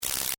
Electric Zap; Zaps; Electronics; BlastWave

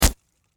Impact Bullet Windshield Hit Glass; Materials

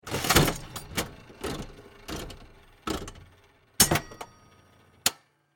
Slot Machine Pull Lever Spin Stop Antique, casino; Sports; Casinos

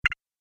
Interface Sound, electrical button

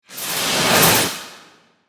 Dimension, Portal, Suction, Science Fiction, 5.1

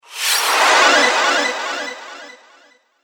Flash Of Light Flashback Whoosh

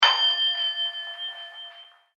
Bell Hotel Desk Antique; Bells; Musical

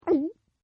Gulp, Eating; Human

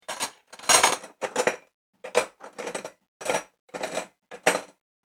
Kitchen Cutlery In Drawer; Kitchen Sound Effects

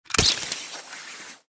Grapple Gun, Grapple, Gun, Fire; Warfare; Miscellaneous Combat (Sound Effects:Combat)

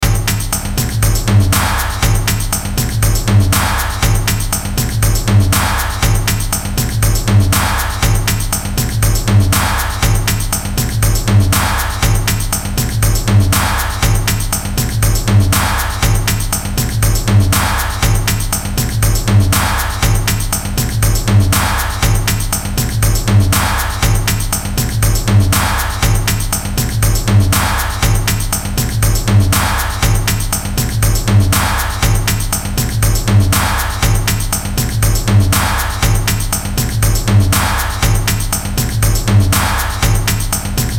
Beat Loop Tribal Jungle Toms Percussion; Miscellaneous Loops

